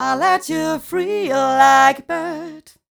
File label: f mel d flat, fem Vocals